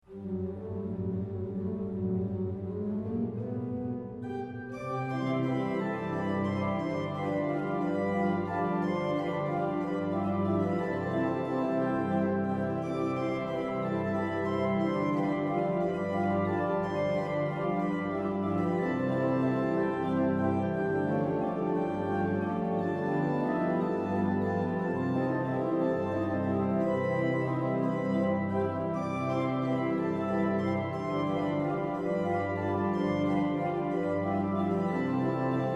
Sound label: Valse, ORGUE